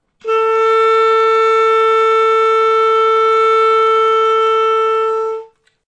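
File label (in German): Kammerton 8 Prozent erhoeht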